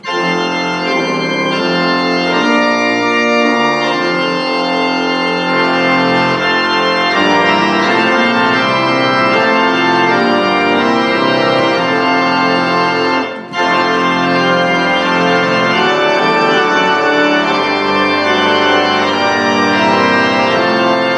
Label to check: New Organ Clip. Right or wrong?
Right